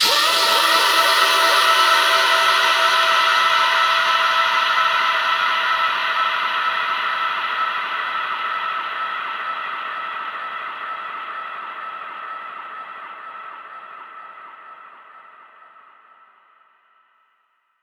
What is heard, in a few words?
Reverb Athmospheres, FX Vocals; Vengeance Samples